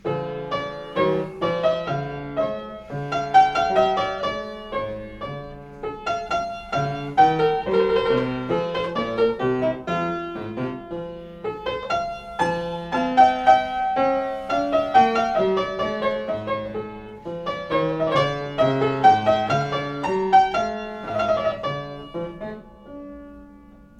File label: minuet; Lohlein